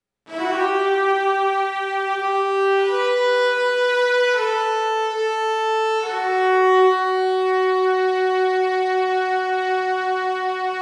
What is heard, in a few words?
Violin; Verdigris Music